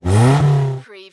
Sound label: Car Motor Engine Rev Engine Perspective